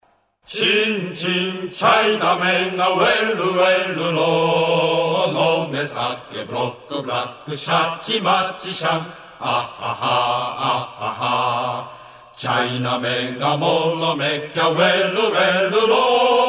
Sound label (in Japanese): チャイナメ節